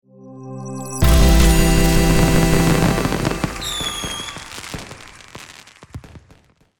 Slot machine casino golden jackpot win

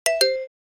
Text message alert

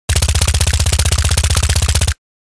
submachine gun short burst; Engineer

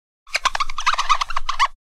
Cleaning glass cup fast squeak clean

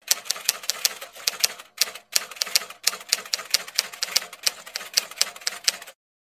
Royal Classic vintage typewriter typing

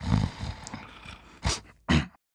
Man snoring wakes up from sleeping

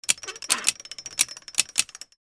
Safe vault dial lock turning click; gears

